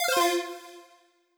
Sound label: button detuned square down